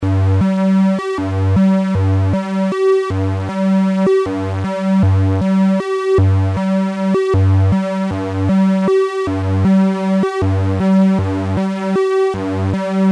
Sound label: pwm